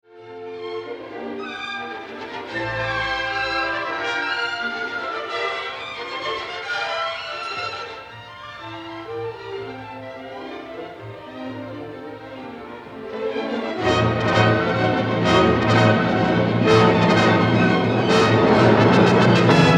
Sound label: Symphony No. 6 in A III. Scherzo. Ruhig bewegt Trio. Langsam Scherzo; Bruckner